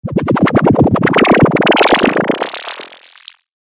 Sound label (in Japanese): まぜる コミカル; 動畫和制作視頻的音效